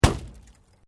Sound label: Metal Light Movement Minor; Metal (Sound Effects:Materials)